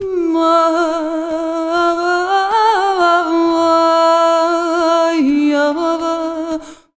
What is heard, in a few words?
VOICES; USB Soundscan vol.63; AKAI